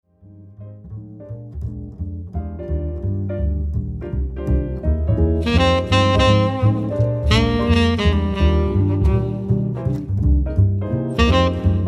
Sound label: Quartet Sax Guitar Bass and Piano